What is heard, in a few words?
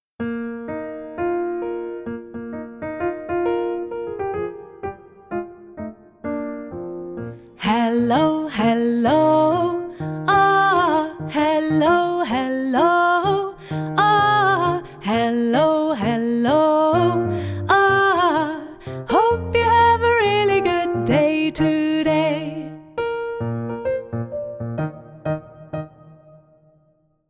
Music Hello; Music-makers